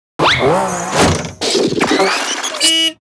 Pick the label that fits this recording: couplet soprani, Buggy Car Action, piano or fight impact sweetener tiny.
Buggy Car Action